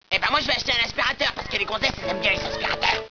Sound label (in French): aspirateur; south park